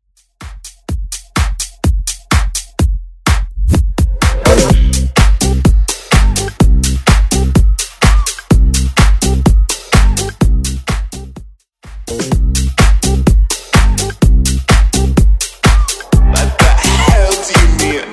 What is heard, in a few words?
Dance Redrum